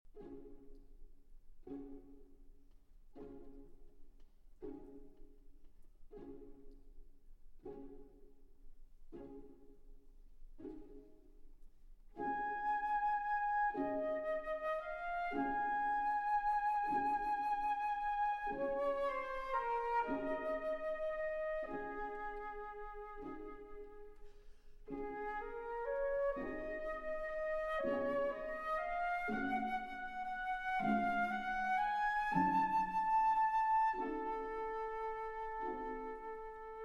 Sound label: Piano Concerto No. 1 in B Flat Minor, Andantino Semplice – Prestissimo